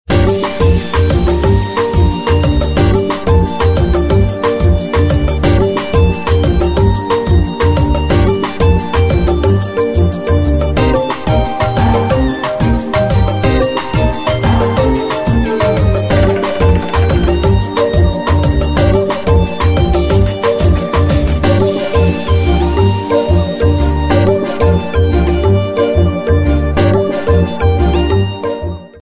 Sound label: galaxy siii ringtone